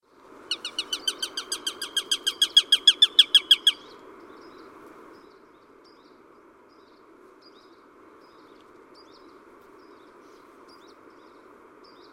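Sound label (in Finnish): varpushaukka, lintuäänet; Metsät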